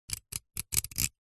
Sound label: household comb metal teeth scrape with sharp metal